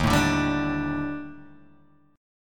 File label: Eb Major 7th Flat 5th Eb Guitar Open G